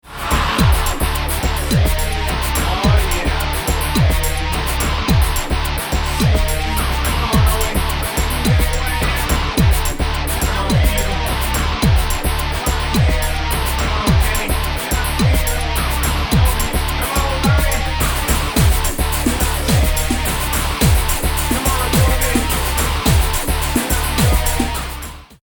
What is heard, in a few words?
rhythm